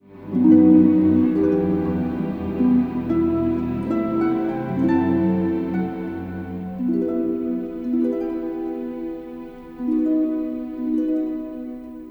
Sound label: love harp; Star Wars > Attack of the Clones